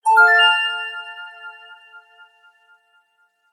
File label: wake up tones; oringz pack nine